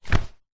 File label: sport wetsuit drop ground; zapsplat